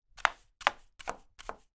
household brush small brushing wooden skirting board